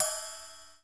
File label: RIDE, Akai MPC3000